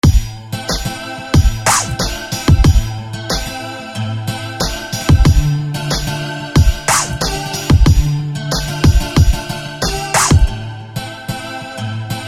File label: Small Vibration; SFB FX